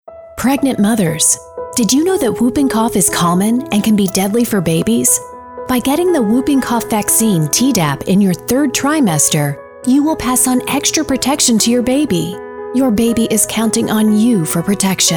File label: Whooping Cough